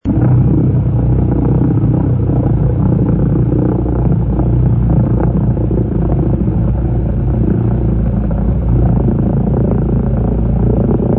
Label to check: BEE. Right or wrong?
Wrong